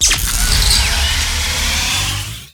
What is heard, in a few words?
boxing gloves crit enabled; tf2